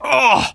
Wound Crit; Prince Valanar

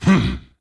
Attack Light; Varimathras

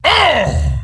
Wound; Kologarn

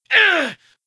Attack; Keleseth

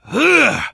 Attack; Prince Valanar

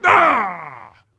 Wound Crit; Captain Rupert

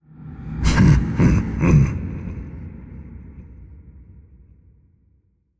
Laughing Emote; Lich King

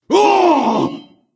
Wound Crit; Stormcaller Brundir

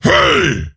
Attack; Kologarn

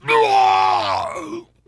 Wound Critical; Putress

